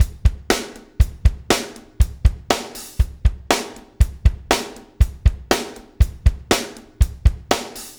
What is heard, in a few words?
DUGA, Backbeat